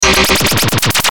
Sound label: Hit Delay, Hit Delay Glitch; Sound Design; Blastwave